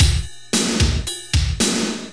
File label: machine; SGI O2 Out of Box Experience; jungle Island; Cyber Gourds